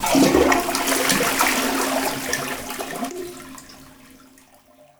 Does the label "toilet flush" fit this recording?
Yes